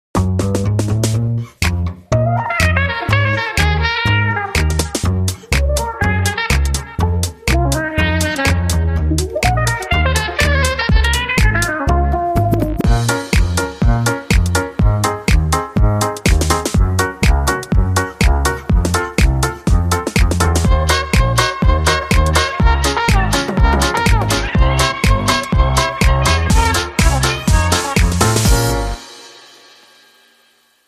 Negroni Swing

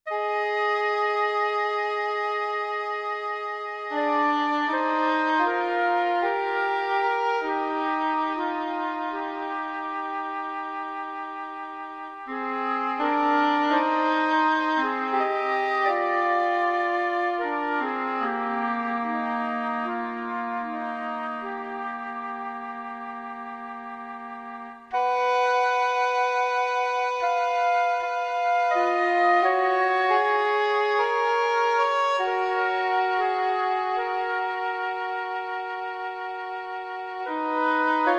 Flute and English Horn

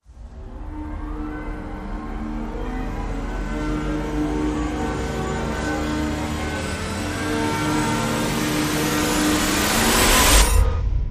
Rise Short

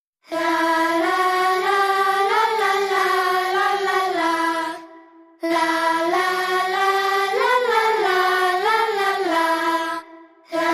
Speeded Choir Only

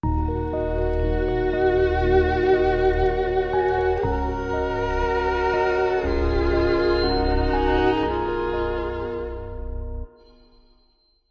Dark Sting

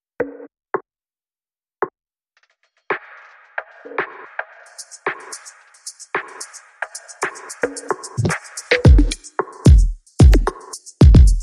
Reduced Percussion

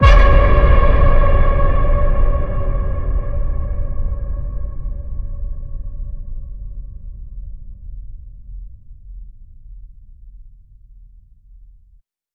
Ping, C sharp

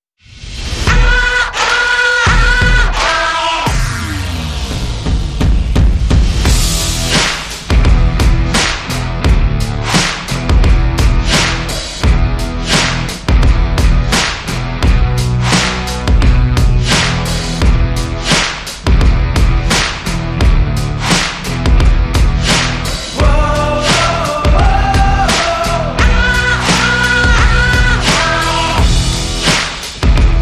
No Lead Vocals No Lead Guitar

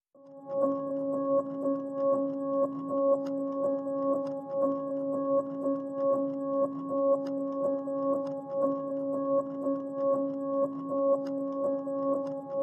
Ambient Mix

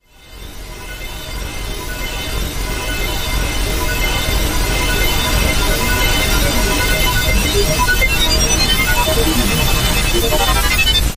Theta Band Transmission

